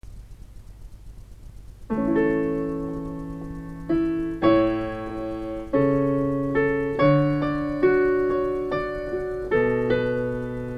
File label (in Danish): tenor satb